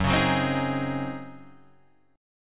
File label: Db Major 7th Suspended 2nd Suspended 4th Gb